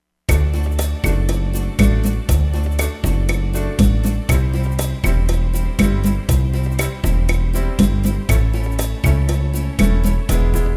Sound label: rhumba